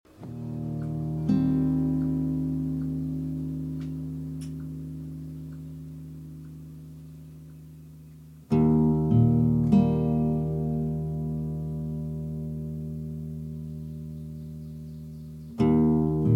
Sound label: Mostly Chimes